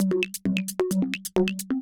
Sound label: 808 Loop Perc 132, Roland 808 Drum Loops, 132bpm